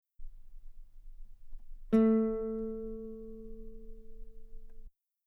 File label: guitar A4 very long forte normal; bananenkeyboard